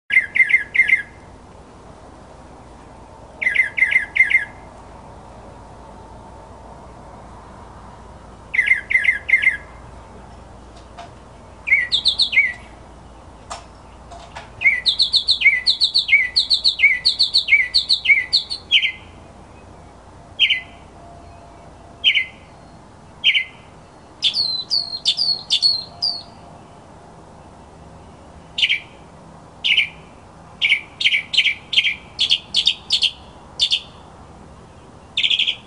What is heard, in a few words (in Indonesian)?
suara masteran mockingbird